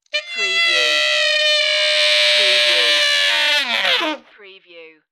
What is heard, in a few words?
Sax Scream